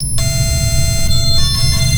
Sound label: sonar Ping Water Close Beacon; Barotrauma; Real Sonar